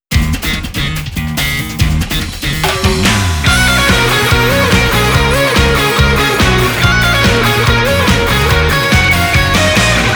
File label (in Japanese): アニメサイズ Instrumental; Pokémon Sun & Moon